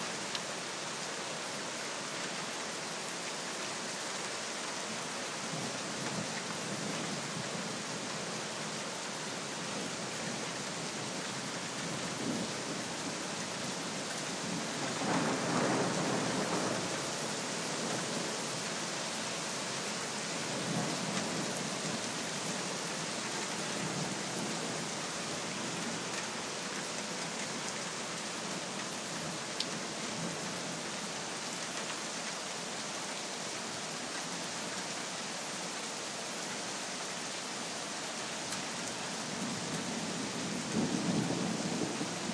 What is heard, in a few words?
60 seconds of thunderstorm in berlin